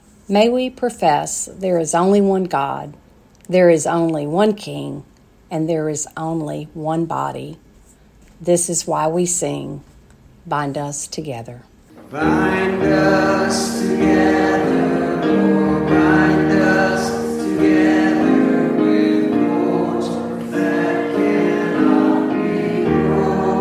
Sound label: Closing Chorus